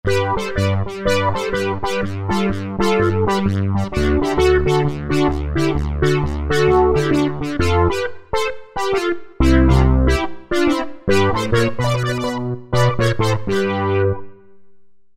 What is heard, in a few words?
Punchy Brass, Roland V-Synth; Factory Patches